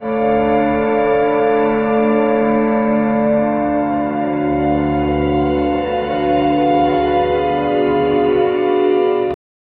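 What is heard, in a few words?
cold melancholic score, melancholic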